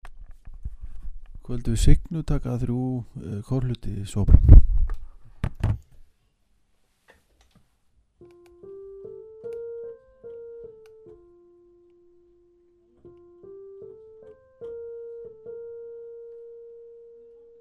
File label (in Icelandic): sópran